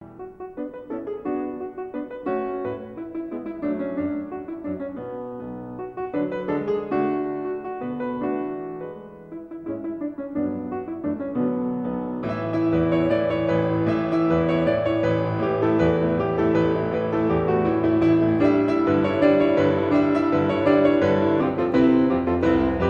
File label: Old Dance Tunes, Piano; 10. L'istesso tempo; 15 Hungarian Peasant Songs for Piano, Sz. 71 (BB79); Bartók